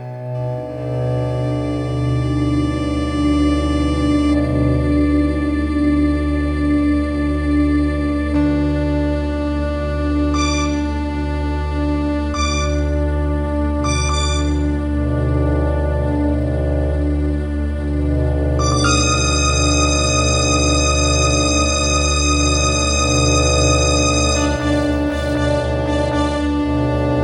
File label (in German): Filmmusik